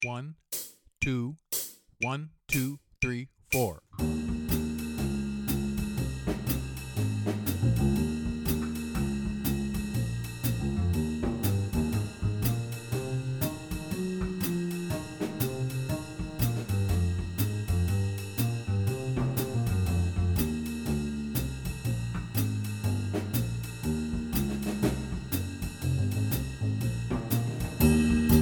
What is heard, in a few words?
Piano Play a Long BLUES; Jazz-Band; All-State